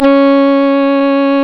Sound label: REED C#; Big Fish Audio; Synclav FM